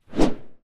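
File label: cavalry spear, weapon; Battle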